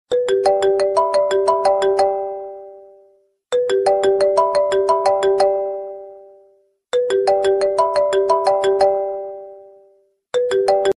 samsung galaxy s23 ultra ringtones vs iphone 14 pro max ringtones; mobile bgm